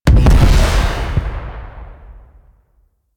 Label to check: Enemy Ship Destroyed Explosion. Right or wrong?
Right